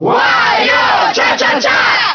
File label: Cheer Italian; Wario; SSBB